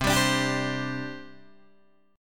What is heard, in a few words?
C Suspended 2nd, C, down, Guitar, Open D